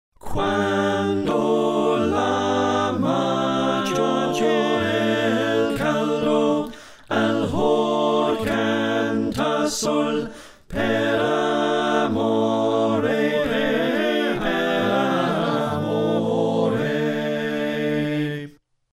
Slow SATB, choir

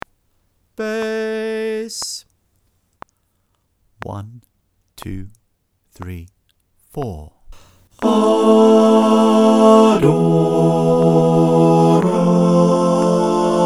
Adoramus Te Part 1 - bas-click

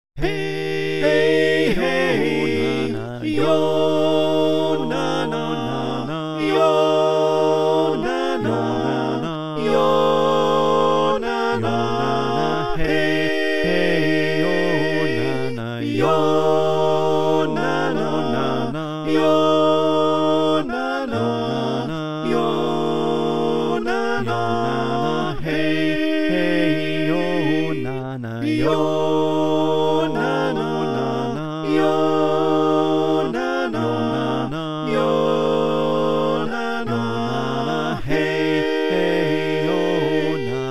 SATB

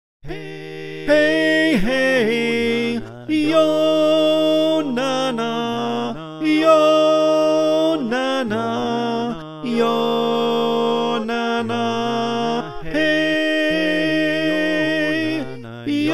Tenor, choir; Yonana

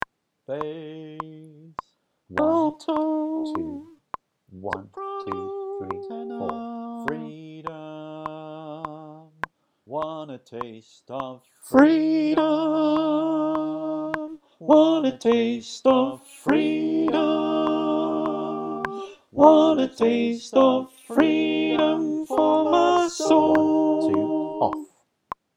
Alto w click

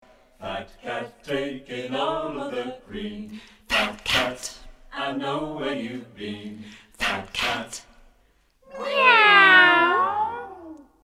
Sop, choir

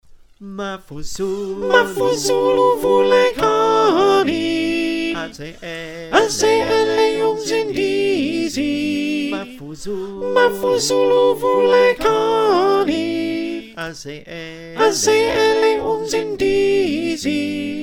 soprano, choir

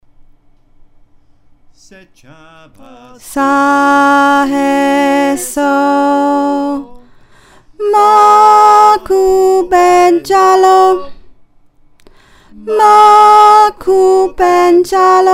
alto, choir

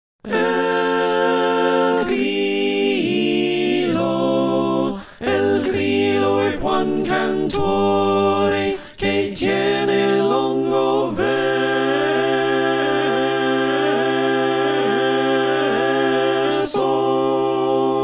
Slow Soprano

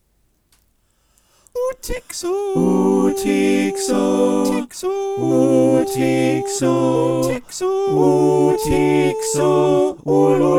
SATB, choir

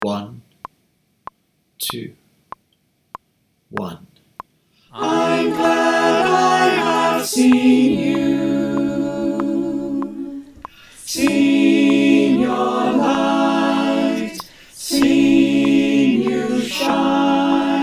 Alto w click

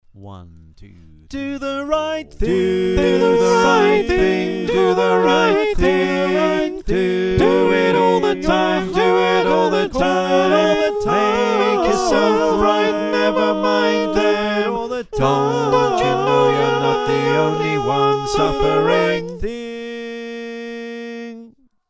SATB

